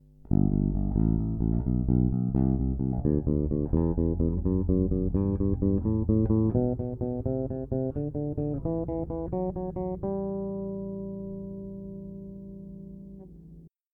ponte chiuso

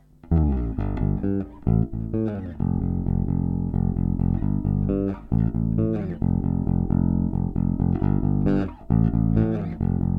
jba54 ponte dita aperto

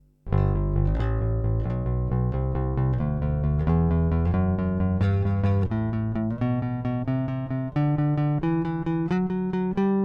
jbneo4 manico plettro aperto

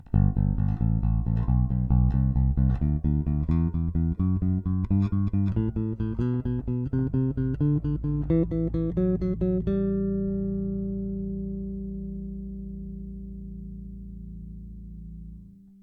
jbneo4 entrambi dita aperto

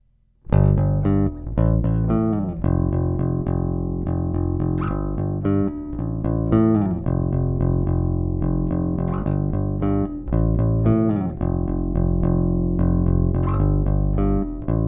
Plettro tono aperto

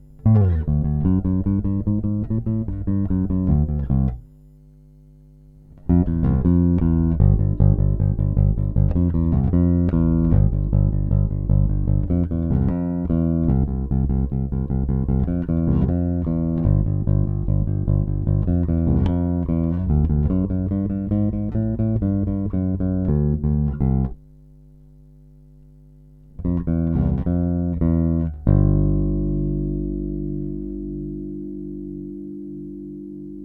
manico dita aperto

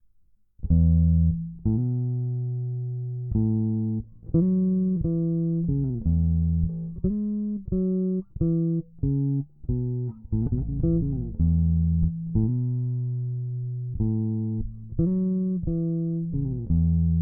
pba 54 dita tono chiuso

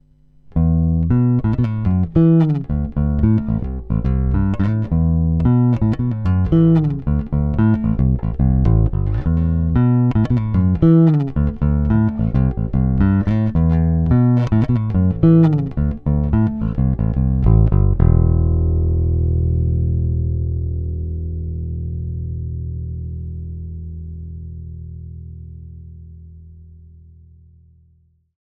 Manico dita aperto; JBA54 VS 60s

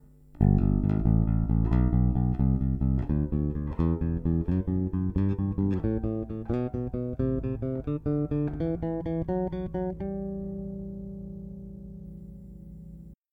jbneo4 ponte dita aperto

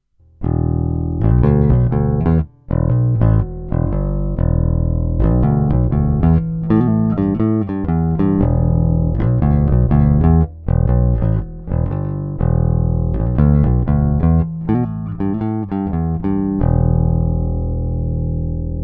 plettro tono aperto; pba5495

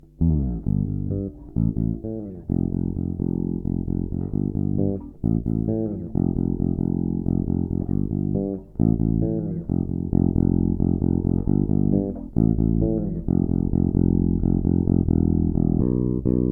jba54 ponte dita chiuso

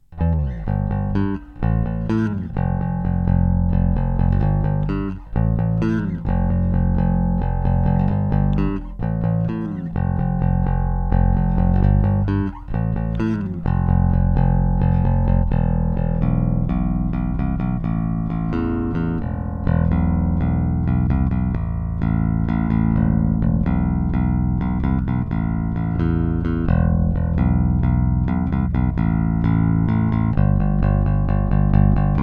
jba54 entrambi plettro aperto

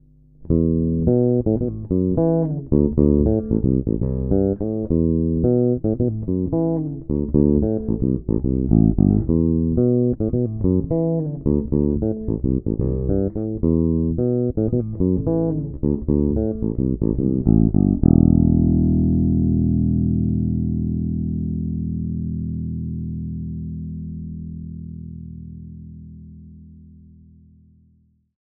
JBA54 VS 60s Ponte dita chiuso

